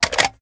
ar reload empty mag out; weapons; gmod; viper

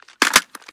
reload empty magin; gmod; viper

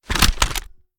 reload empty end rotate; gmod

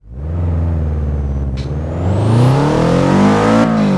first, vehicles; gmod; tdmcars; sub wrx sti

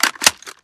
mike4 reload mag in, weapons; gmod; viper

